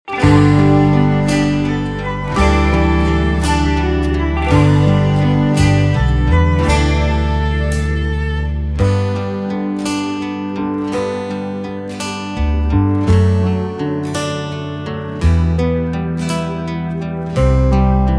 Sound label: (G) No Backing Vocals